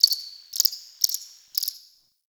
Cricket; Warframe; The New War; Ambience; Zariman

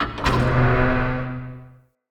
Vent Open; Encounters; Ode Pollution Tower

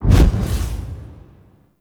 Investigate Action; Warframe; Fortuna; Venus Fortuna Quest

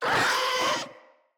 Infested Charger Death, Enemies

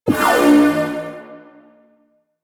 Shot Layer, Blastor Pistol; Warframe; Weapons; Corpus; Plinx

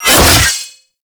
Drill Scythe Whoosh; Warframe; Grn Drill Scythe

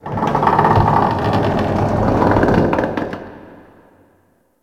Ice Metal Creak; Warframe; Corpus Ice Planet; Ambience

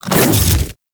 Infested Splitter Melee Swipe; Warframe; Grey Strain

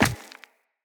Walk Rock, Footsteps; Operator

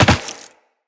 land rock, footsteps; Characters; Tenno

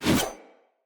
Axe Whoosh; Weapons; Enemy; Axes; Glades Axe

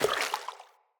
Grunt Step Water, footsteps; Enemy; Corrupted Sproutfolk

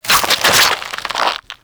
Ghoul Devourer Hook Tongue Projectile; Warframe; Grineer Ghouls

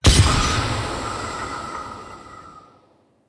Polluter Eye Hit; Props

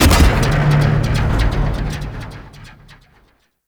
Void Dragon Latch On; Enemies; Gameplay; Warframe; Duviri

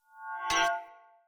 Great Sword Accent; Weapons; Swords; Dendrit Ara Great Sword; Accents

